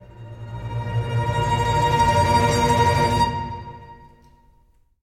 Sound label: Stingers Strings